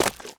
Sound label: mud, footsteps